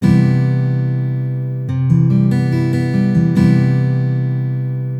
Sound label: C Major 7, guitar chord